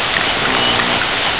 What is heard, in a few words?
Unknown sp Sound